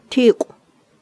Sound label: hit